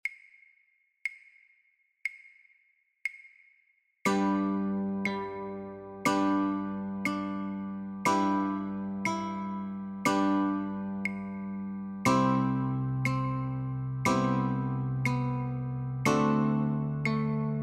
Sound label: Notes and Chords, guitar; Twinkle Twinkle